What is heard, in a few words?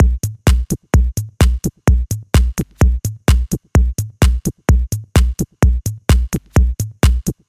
128 Bpm Deep Minimal Groove